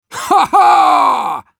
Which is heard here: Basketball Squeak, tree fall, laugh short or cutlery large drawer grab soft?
laugh short